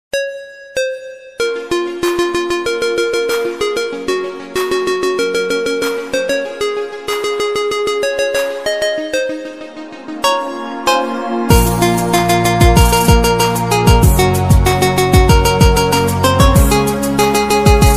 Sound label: Instrumental Ringtone